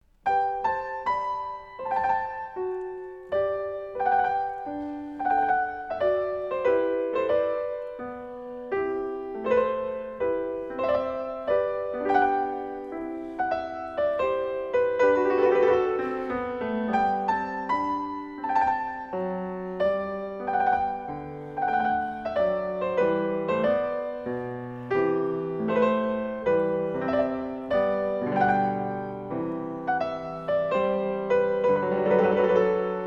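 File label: Menuet, piano; Rameau; Suite in D major-minor; 1728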